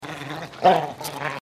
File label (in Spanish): perro gruniendo; perro rugiendo